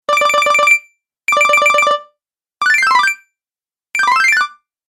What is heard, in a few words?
Game Collect Your Bonus Pack, Video Game Sound Effects